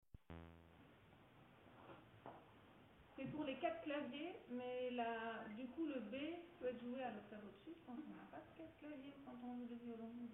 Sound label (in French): Mignonne Mazurka Basses, nyckelharpa